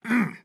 Attack Medium

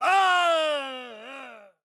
pain death; Batman

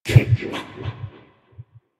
Heartbeat

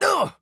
Hurt; Jake

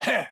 Attack Small

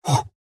Punch

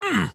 Hurt

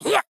Stripe Jump

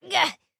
AHurt

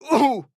Hurt

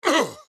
AHurt; Black Adam

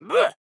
Evade

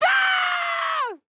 Death; Fern

